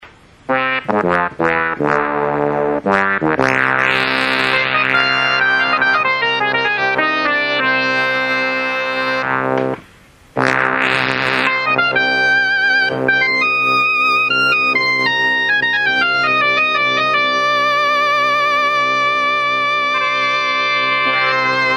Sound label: cs80 unison mod